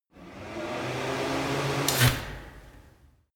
wall fan full sequence

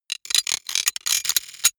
robot rustle click

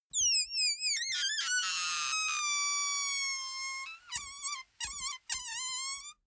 balloon air release squeak glitchy

